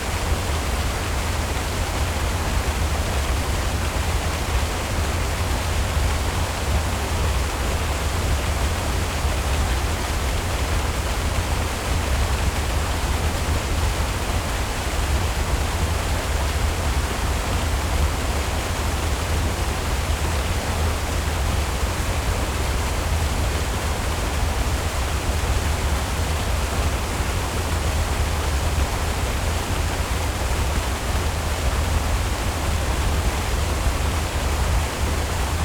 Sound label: Waterfall Roaring Rumble; Justsoundeffects; Streams and Rivers